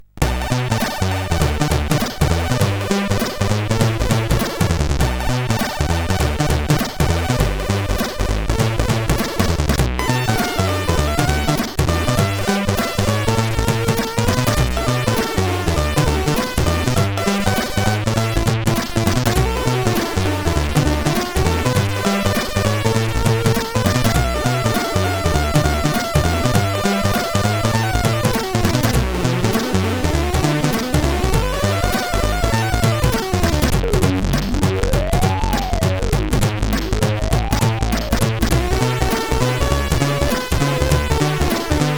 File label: Europop, sid CSG8580R5; Another Harmless Europop